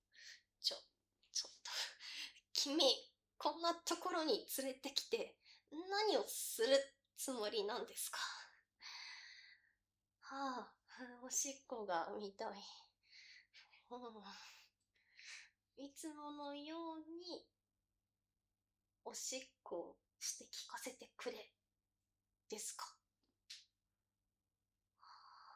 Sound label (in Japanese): 実演「おしっこ（室内、洗面器）」 バイノーラル